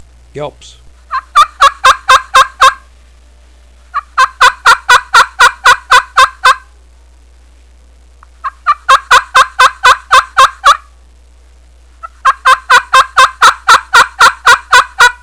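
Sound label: yelps, turkey call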